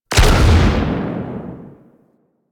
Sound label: Ship Primary Cannon Fire; Weapons; Warframe; Railjack